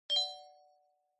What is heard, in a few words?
cpu score